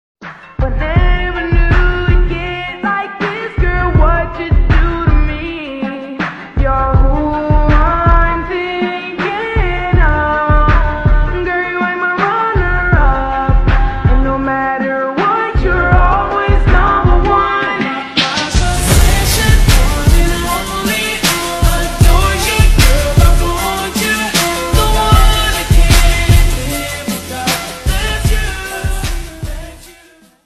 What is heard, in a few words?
slowed english ringtone